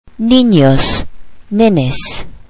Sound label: children